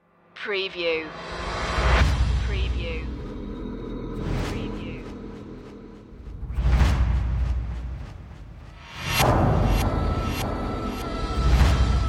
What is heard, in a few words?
perc mix